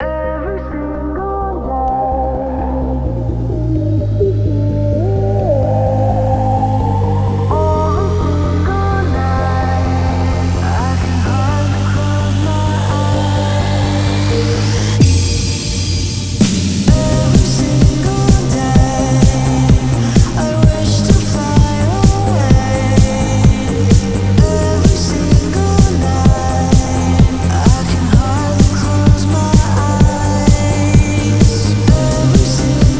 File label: music; processing-java-primer